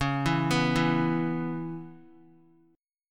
Db Minor 7th Db down Guitar; arpeggiated; 4E,4A,5D,5G,5C,6F

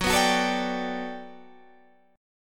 G Major 9th, F sharp

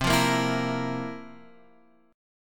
F Major 7th Suspended 4th C

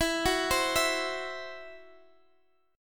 E Suspended 2nd Sharp 5th E, Guitar, Standard; arpeggiated; capo 2; x,x,14,11,13,12

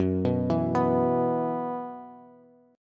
F sharp 7th Suspended 4th, down, Bass; arpeggiated

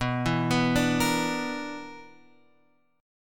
B Minor Major 7th Double Flat 5th, Guitar, Open F; BmM7bb5 arpeggiated; JGuitar

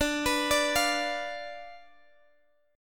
D-Minor 6th, D, Guitar, Open G

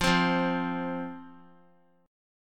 F 5th F, down, chord; F5 strummed

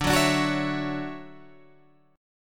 D-Major 9th D down Guitar Open G

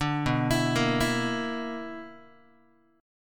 B Minor Major 11th, BmM11 chord, Guitar; arpeggiated; 5,7,7,8,8,x; JGuitar

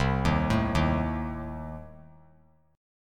C Minor 6th, chord; Cm6 arpeggiated; JGuitar

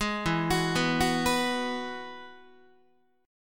E Major Add 9th, Guitar; arpeggiated; JGuitar

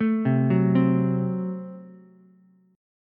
F sharp Diminished F sharp, Ukulele; arpeggiated

